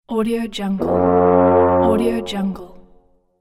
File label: boat horn; vexsart